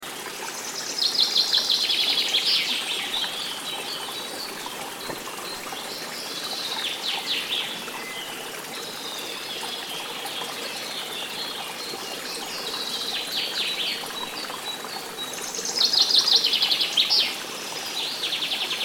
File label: Relaxing forest sounds